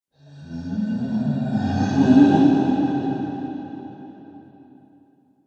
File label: Ghostly Halloween Monster